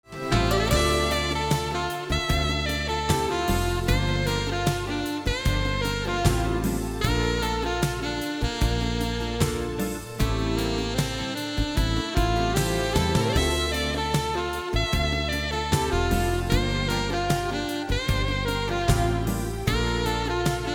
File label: Sax